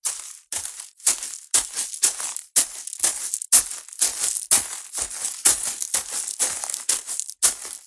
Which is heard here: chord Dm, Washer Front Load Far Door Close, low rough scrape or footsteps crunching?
footsteps crunching